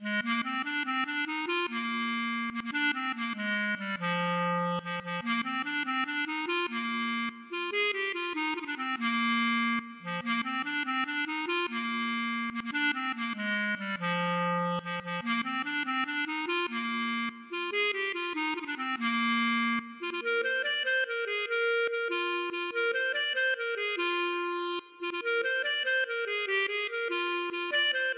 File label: klezmer